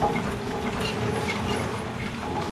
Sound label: Trolley Loop; Level3